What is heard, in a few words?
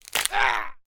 horror bone arm leg break male scream; sound effects one